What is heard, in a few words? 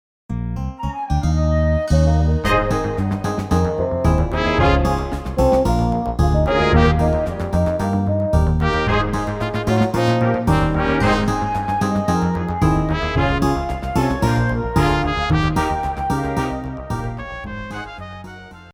Instrumental